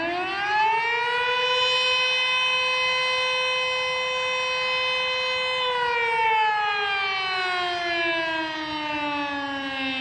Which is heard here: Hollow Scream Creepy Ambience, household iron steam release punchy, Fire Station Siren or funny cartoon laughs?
Fire Station Siren